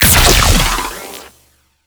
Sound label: 2D Shot; Crp BFG